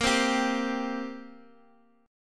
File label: G Minor Double Flat 5th A sharp